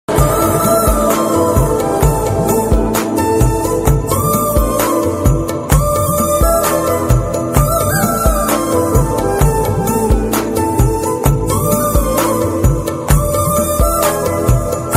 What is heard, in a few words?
bgm love ringtone romantic bgm sad ringtone; radhe shyam